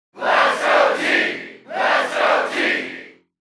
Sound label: American football; Sfx Americanfootball